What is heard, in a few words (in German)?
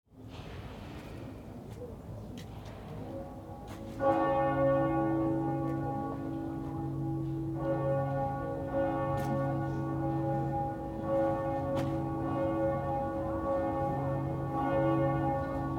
glocken